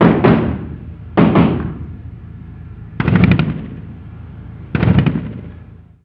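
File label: gun us assault medium; ModernWarfare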